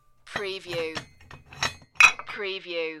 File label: Weights